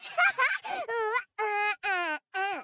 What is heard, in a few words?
Woman Dance Happy; voice; Zomboid; Lifestyle